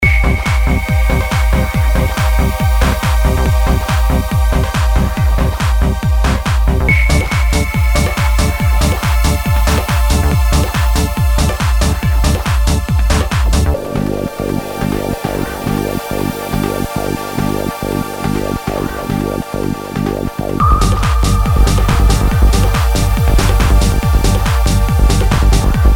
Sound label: Techno Trance